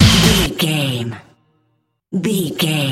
sting, Dance, EDM, Club, Disco, Eurodance, Confident, Energetic; party end time; Exercise; Aerobic; Aerobics; Spinning; Workout